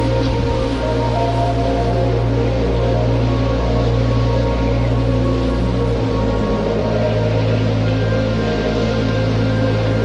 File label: windmusic; We Were Here